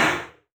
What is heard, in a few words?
Aircrafts FW-190D9 Flaps End; DCS